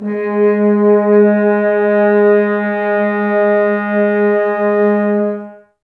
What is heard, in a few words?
G#, Brass, F.Horns, Woodwind Brass Ensemble; Sample CDs; Miroslav Vitous